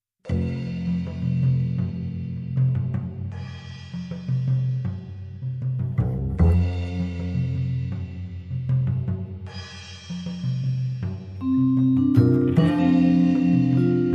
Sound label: Vibraphone